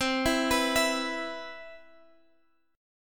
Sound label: C Major 7th, C, down, Guitar, Drop D